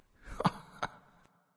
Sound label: Lobby Minion Laugh; smite; kumbhakarna